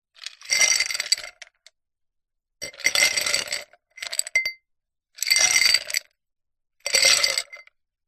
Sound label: ice cubes several thick drinking glass no liquid tip into another glass; food drink; zapsplat